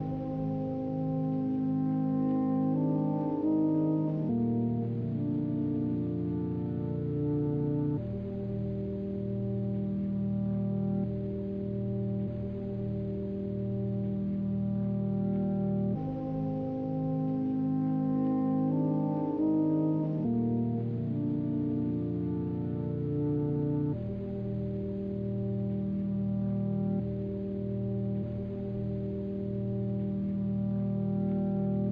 Water Tune; Proteus

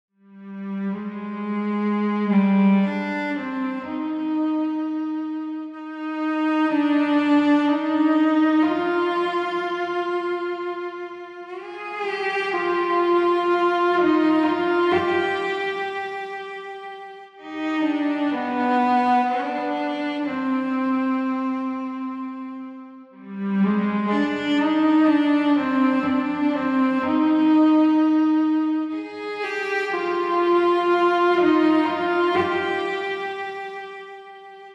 Lullaby Cello; stasis

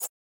Catch Blade; Boomerang Fu

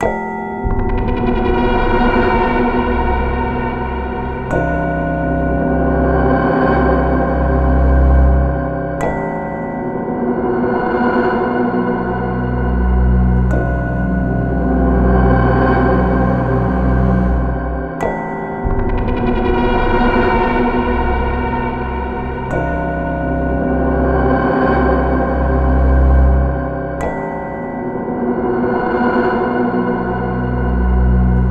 Unsettling Ambience; Pokemon Ultra Sun; Pokemon Ultra Moon

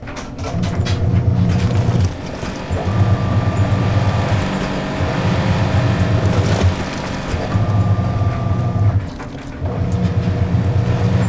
washer break

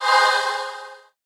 Sound Sign Bible; Peace, Death

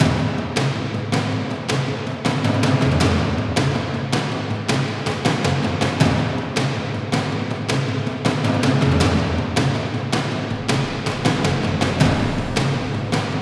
Battle Warrior Drums Sting; rambo the video game 2014

